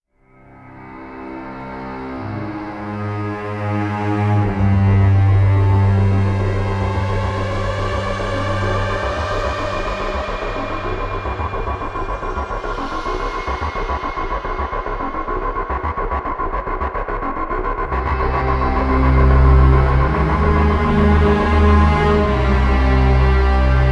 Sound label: Suspense Oneshot